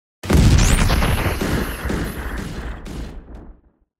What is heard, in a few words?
Thunder element